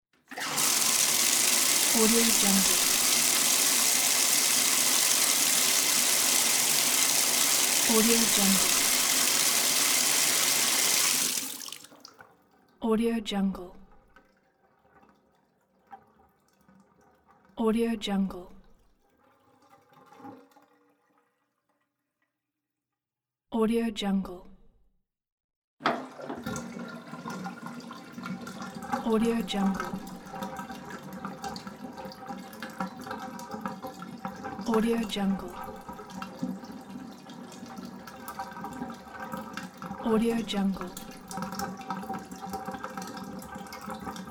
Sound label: bathtub water run drain